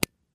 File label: Buttons